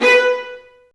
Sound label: String Sections, Violas Marcato; Roland LCDP13